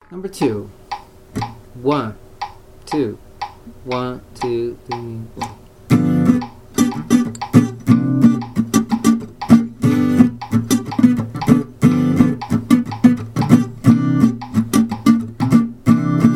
claves original clave comping 12 bar blues

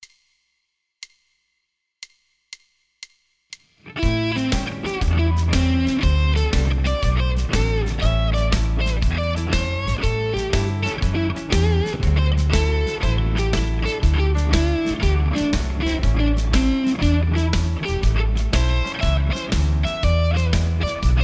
blues 4 times on each original clave; 12 claves

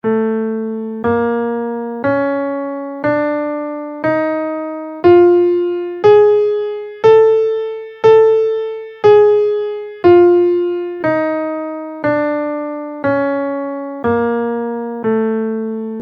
persian scale in A, guitar

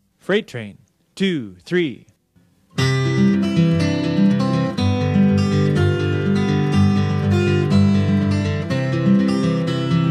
travis picking

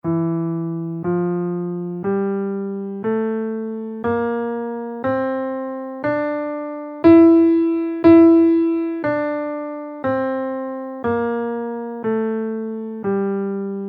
locrian scale in Em

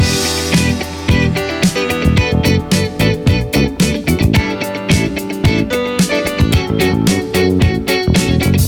loop

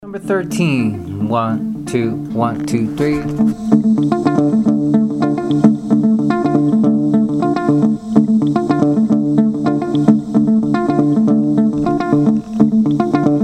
mozambique patterns

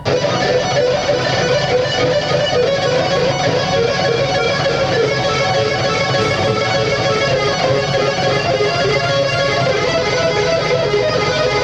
sweep picking example

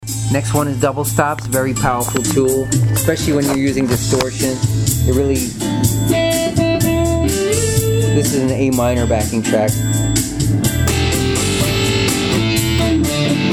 expressive elements double stops

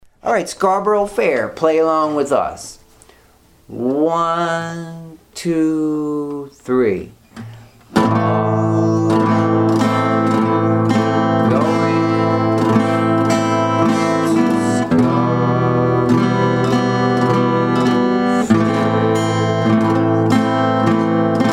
scarborough fair playalong

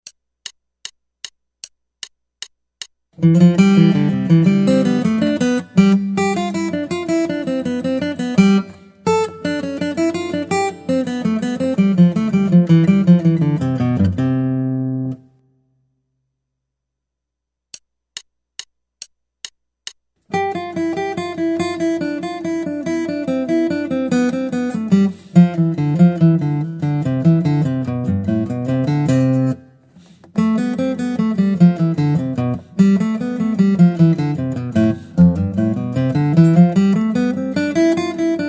Guitar

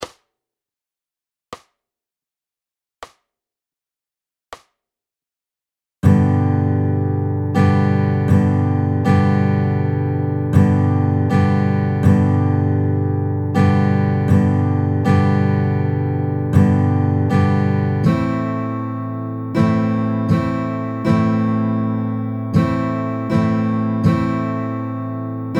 G G Am Am 40 bpm

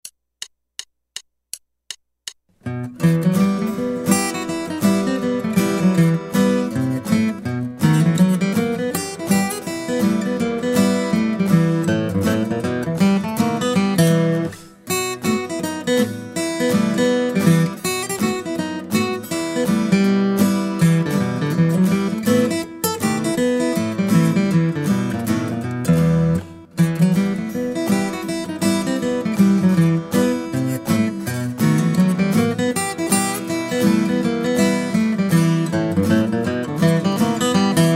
Guitar

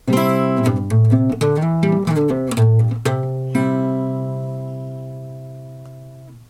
a shape major embellishment lick d

